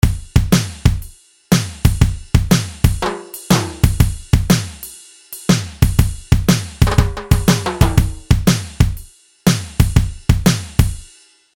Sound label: HR16 Loop